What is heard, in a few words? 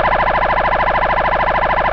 SIREN